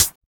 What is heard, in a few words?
Closed HH; Vengeance; Essential Clubsounds Vol.4; Cymbals; Closed Hihats